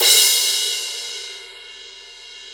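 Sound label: CYM Cra, crash cymbal; Best Service Gigapack 2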